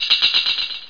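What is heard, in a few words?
Tambourine Loops; Prince of Persia 2 Demo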